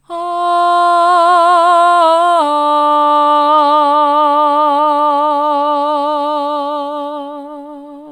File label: ahh mid, vocal